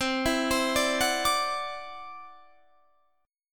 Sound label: E 7th Suspended 2nd Sharp 5th C, chord